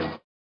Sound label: SWUSH; Spectrasonics; Metamorphosis